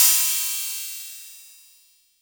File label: Cymbal